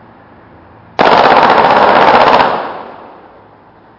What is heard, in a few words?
30 Rounds full automatic